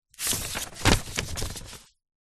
paper sheets documents browse through rustle movements; zapsplat